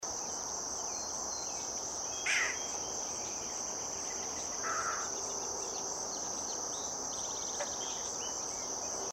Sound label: Least bittern; پرنده بوتیمار کمینه